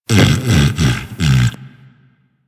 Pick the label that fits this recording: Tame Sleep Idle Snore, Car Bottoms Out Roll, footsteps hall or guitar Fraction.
Tame Sleep Idle Snore